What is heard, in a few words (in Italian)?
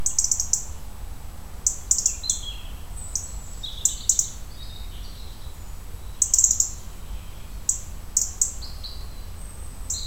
verso pettirosso erithacus rubecula european robin petirrojo europeo; bioacustica